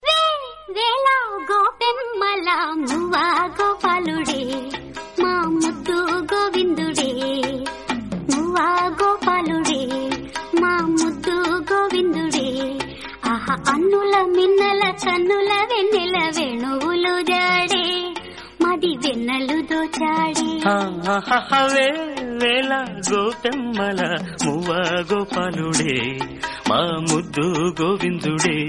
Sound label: Telugu Ringtone